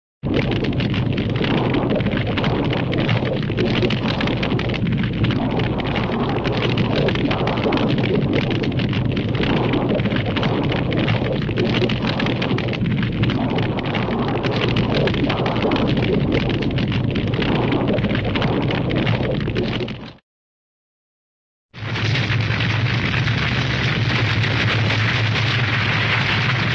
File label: fire blazing inferno fire heavy roaring blaze; cartoon